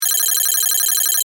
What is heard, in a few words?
Data Beeps Readout, Scifi; Tech; BlastWave